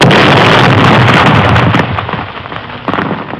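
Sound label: explosion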